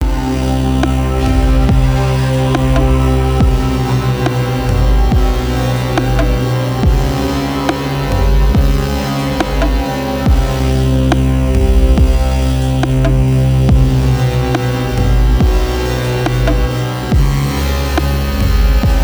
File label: Dark Threatening Scary HipHop no guitar; CUES for film TV games etc